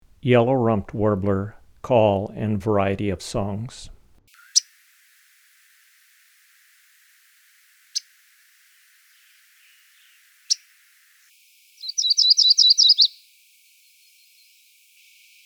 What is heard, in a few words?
Warbler Yellow rumped